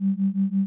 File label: computer; Star Wars Knights Of The Old Republic II; XBOX